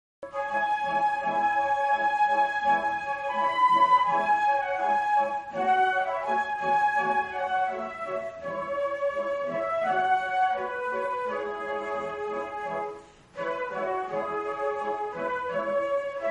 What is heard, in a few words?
concert, flute